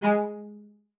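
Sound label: CELLO spiccato G#3; Xsample VOL-5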